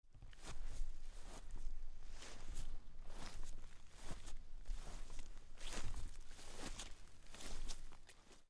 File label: walking